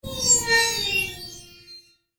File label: Science Fiction Spaceship Pass By Left To Right Fast Whoosh Flange; Spacecraft